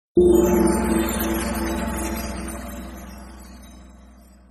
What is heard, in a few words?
gong meme sound effect